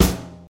acoustic snare sample b key, acoustic snare sound tuned to the B note